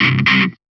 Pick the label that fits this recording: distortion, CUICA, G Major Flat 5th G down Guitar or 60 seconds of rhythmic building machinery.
distortion